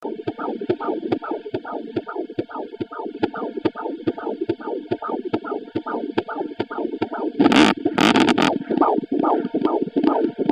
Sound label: baby, Heartbeat, Ultrasound